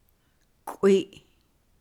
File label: climb up